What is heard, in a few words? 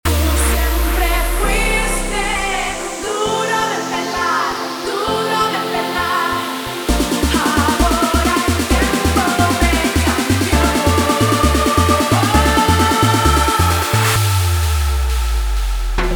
intro outro 132 bpm